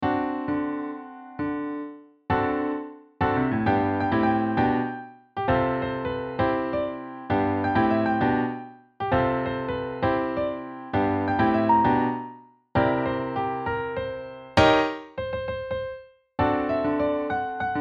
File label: accomp. w melody